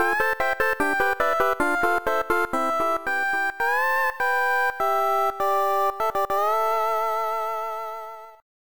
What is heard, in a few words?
16bit game over